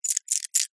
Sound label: board game tokens metal handful movement hand; monopoly